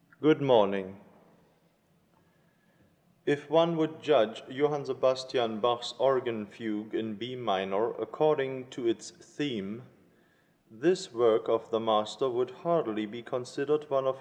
Prelude and Fugue in B minor Introduction to Fugue; Bach; BWV 544